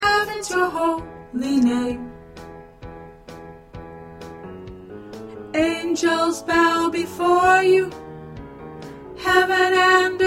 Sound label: Alto